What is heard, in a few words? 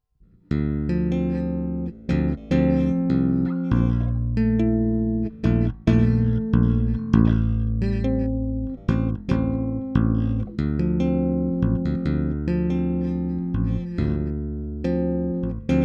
Tapping